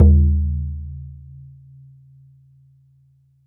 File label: BODHRAN, Celtic Percussion